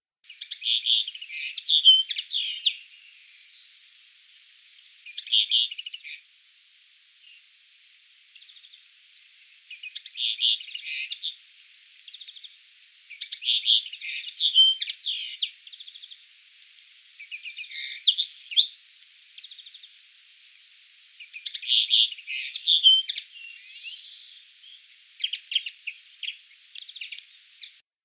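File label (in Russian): konoplyanka golos, linnet voice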